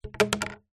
card tube drop wood floor

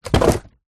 foley cardboard box drop

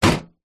industrial tool box metal old medium down

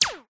science fiction weapon gun silenced single shot; zapsplat

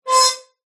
industrial gate farm metal swing squeak

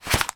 foley christmas cracker pick up from table; zapsplat

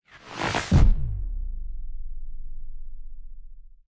sound design whoosh cracking into slow motion; zapsplat

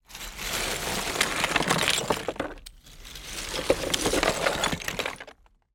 foley small debris rubble movement

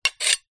glass shards x2 scrape together; foley

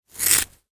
cutting hair scissors single cut; foley; zapsplat

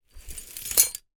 foley glass broken picture slide shards movement

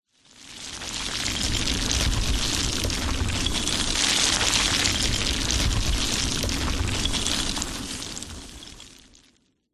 nature landslide short heavy

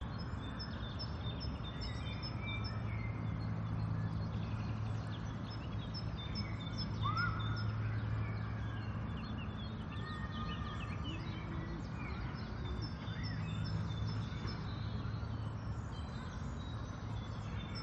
calm spring park ambience loop; the sound pack tree

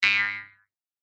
cartoon spring boing jump jaw harp high pitched

